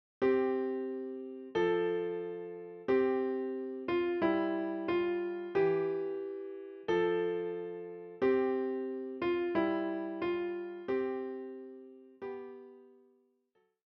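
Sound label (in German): O du fröhliche, Klavier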